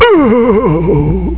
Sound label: u-u-h-h; Gold Digger 1.2.1; Arcade